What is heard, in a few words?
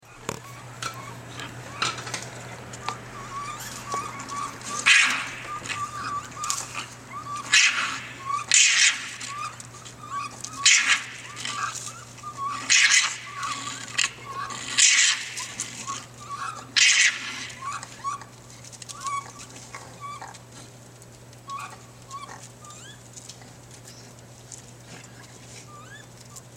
raccoon fight; wildlife; varmints